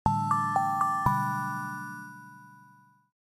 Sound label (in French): synthe; Logos